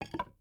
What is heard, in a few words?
ui drop metal wood; Rust